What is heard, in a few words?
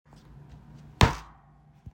slam